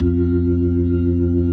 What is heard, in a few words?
B-3, Jazz